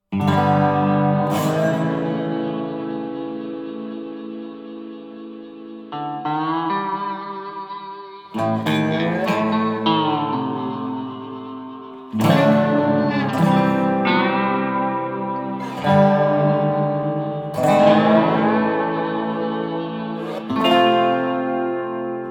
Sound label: Music Ambient Tension Guitars Only; StarCraft 2 Wings of Liberty; MissionMusic